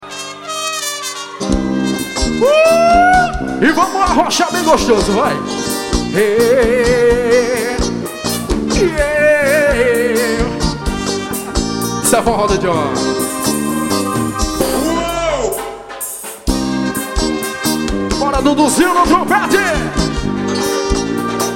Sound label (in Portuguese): forro